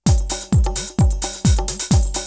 house, loops

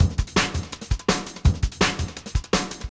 breakbeats

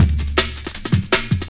160bpm, breakbeats

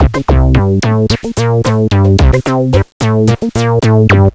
synbass

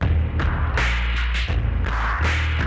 break, breakbeats

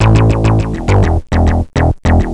loops bass

